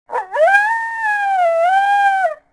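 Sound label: Wolf Fidget Vox; Civ4 warlords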